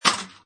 Pinball Sound Metal Plates Release; Video Games

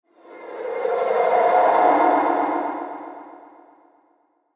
Whoosh Soft Flange; Whoosh Soft Airy Flange; Blastwave; Whoosh Sound Effects

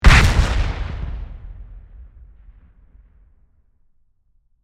Explosion Complex, Blast; Warfare; Bombs; Explosions; BlastWave

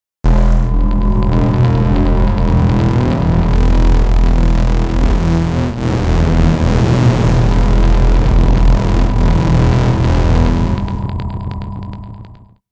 Eerie Warp Harsh Warble; Production Element Imaging Element Accent Transition

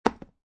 Poker Chip Dropping On Felt; Poker, gambling; Casinos; Lynne Music

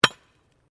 Digging Pick Concrete Hard; Various digging tools and processes; Construction

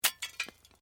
Metal Light Movement Minor

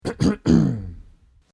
Cough Clearing Throat Human Male

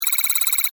Data Text Typing, Beep, Readout, Scifi; Tech

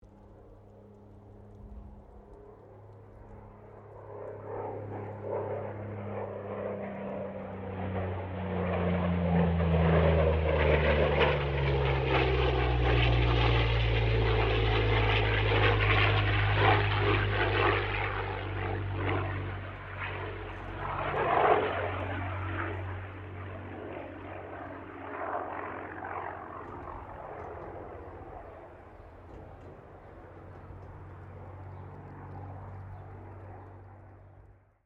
AIRCRAFT P-40 KITTYHAWK PASS STEREO; P-40 Kittyhawk / Warhawk / Tomahawk; Airplanes

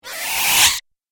Force Swoosh; With Delay; Whoosh Sound Effects; Andrew Fleming

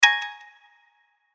Hit Twinkle Reverb, Hit Bell High Short Delay; Sound Design; Production Element Imaging Element Accent Transition; Blastwave

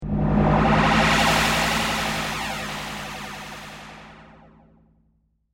Teleportation Door, sci fi

